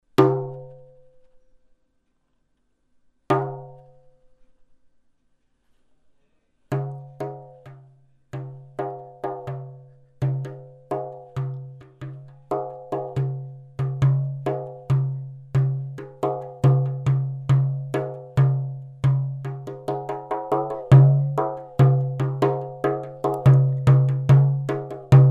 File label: Talking drum